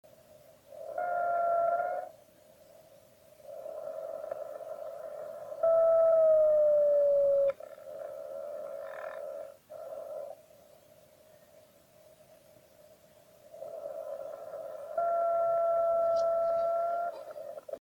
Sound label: beacon